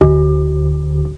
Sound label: Bong